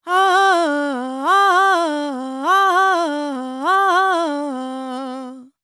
World Voices, INDIA; ILIO; Vocal Planet

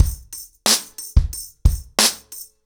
90BPM, ACOUSTIC DRUMS

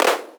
Clap, 707; classic machines; 707mod

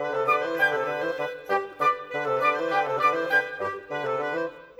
Winds, Orchestral

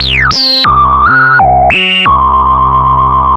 Analoop, Industrial Analog

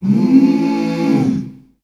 Mmm A 100, Voices Of Africa Sung Phrases

